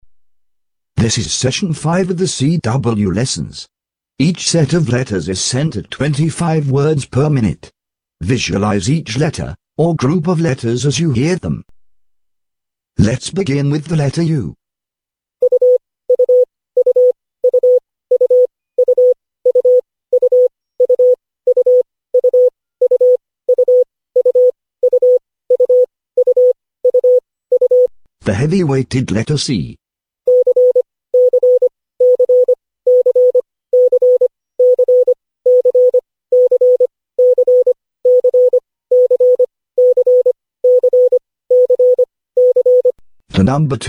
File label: cw training